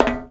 walk metal, FootStep; WarRock

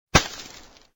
Snow body impact

